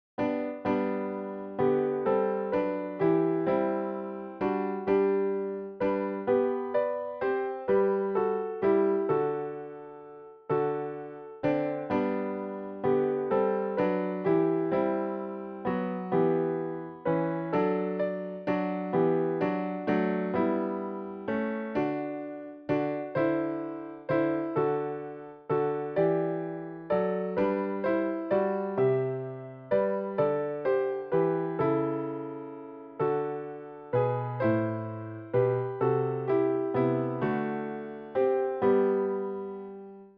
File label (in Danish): alle stemmer; Det dufter lysegront